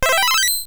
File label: egg hatch; P3D-Legacy